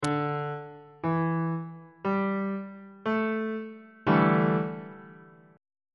a dominant 7th suspended 4th 1st inversion on bass clef